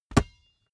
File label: basketball catch hard